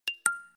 jingle; itel

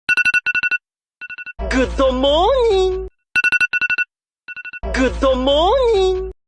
anime alarm

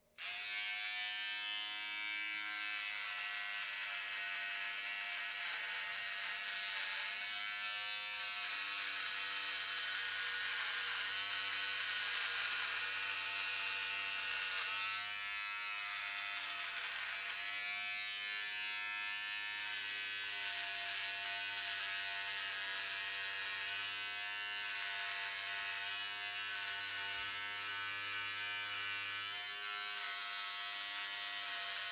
trimmer philips

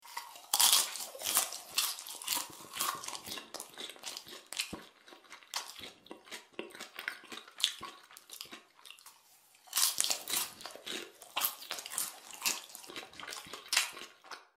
pop

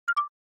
laugh message; Системные звуки и уведомления iOS 18 (iPhone 16, 16pro, iPad)

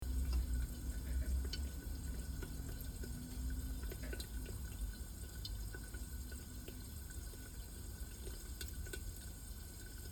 popcorn sound

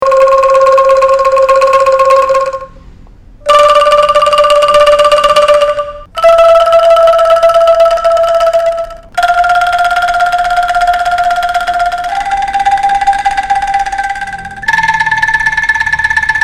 angklung

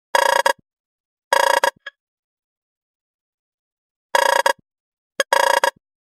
hammer, nothing phone

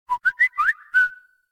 whistle

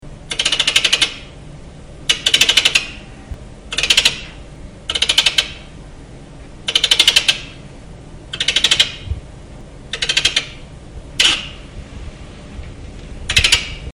stoat

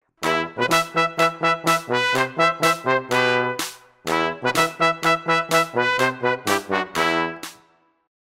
trombone

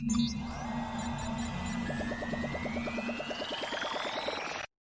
loading

